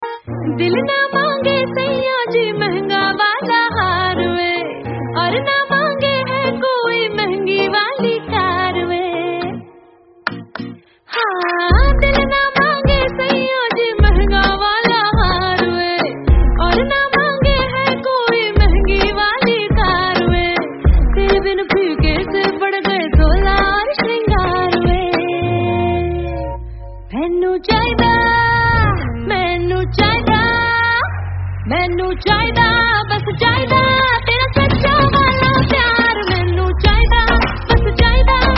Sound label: Song Ringtone